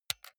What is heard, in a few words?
console controller xbox rb press